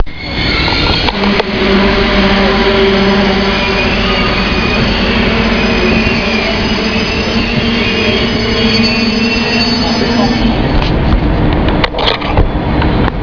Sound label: tgv; france